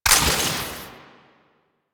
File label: Ammo Pickup Corrosive; DE; Warframe; Warframes; Frumentarius; AmmoAbility